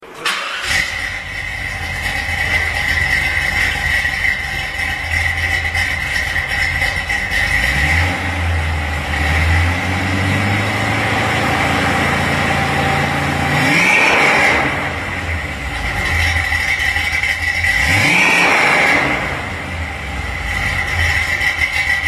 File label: Vette 454, custom exhaust systems